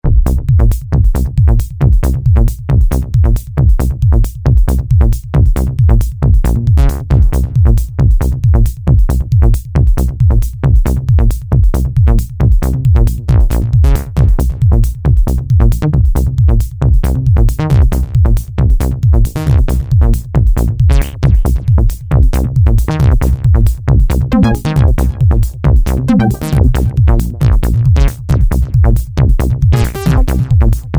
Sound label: TECHNO-HOUSE DEMO, Korg microKORG; Factory Demo Songs